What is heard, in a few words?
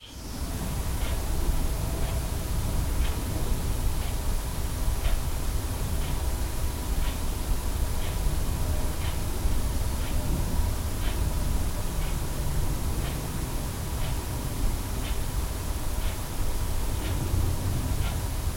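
room sounds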